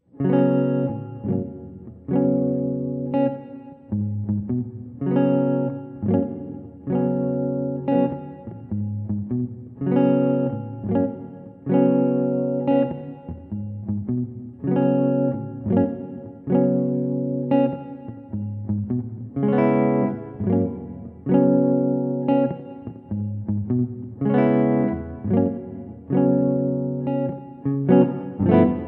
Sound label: jazz tunes